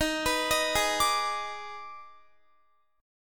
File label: Eb Major 7th Suspended 4th Sharp 5th Eb, Guitar; EbM7sus4#5; arpeggiated